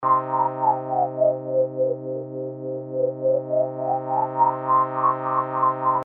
LFO Evolving Pads and Soundscapes, Evolving Pad – Cutoff + Resonance; low frequency oscillator